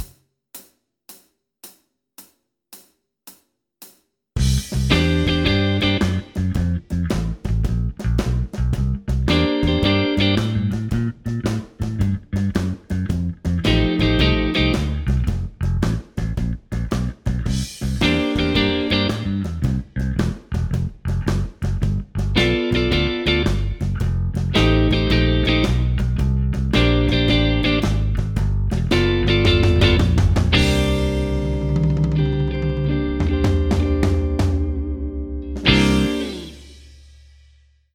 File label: full, 9th chord substitutions, blues guitar